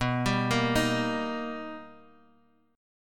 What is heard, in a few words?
B Augmented Major 7th B down Guitar Open G